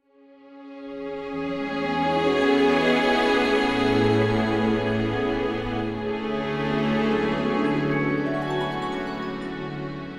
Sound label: bgm camp; warriors of the lost empire psp gamerip 2007